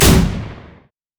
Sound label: teleport; LoL; diana